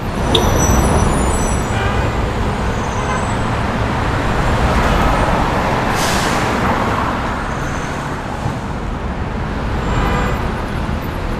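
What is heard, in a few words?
city ambience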